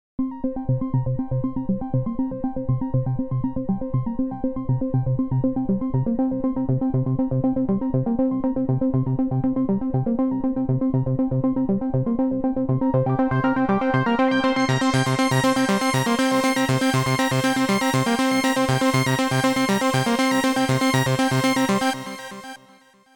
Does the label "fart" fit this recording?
No